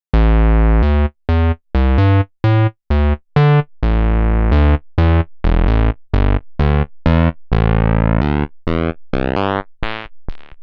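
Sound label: pwm square; Buzz plugin; TB4005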